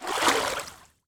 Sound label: water wade